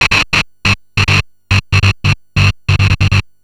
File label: Treat Guit, Industrial Nasty